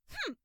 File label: "hmph"; haruhi